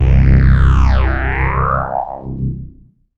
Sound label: Single Electric Basses, Dance Floor Bass